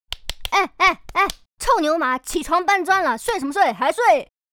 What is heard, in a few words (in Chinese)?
起床 上班族, 手机提示音; Hanser手机提示音包